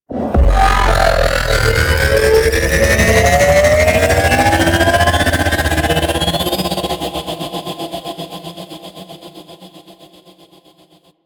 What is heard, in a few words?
UFO Attack Charge Up; Warframe; Corpus; Jackal Redux; Abilities